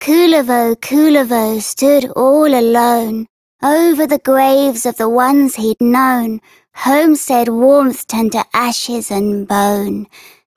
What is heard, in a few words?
Child F, Childrens Rhymes; Warframe; Duviri; Kullervo